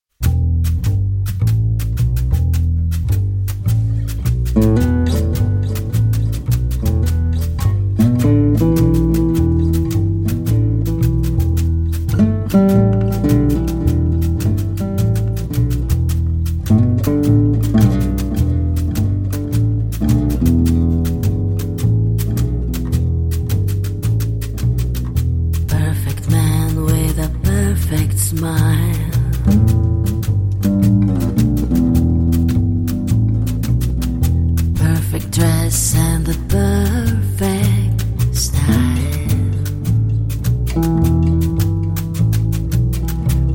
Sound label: Blues